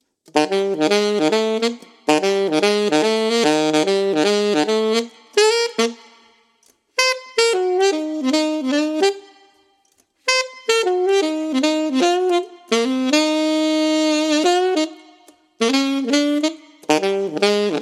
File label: Alto Sax